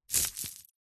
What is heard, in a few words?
household jewelry necklaces set down carpet or soft surface